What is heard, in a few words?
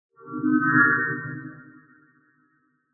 notification chord minor third flutter slight overtones ascend; Skyclad Sound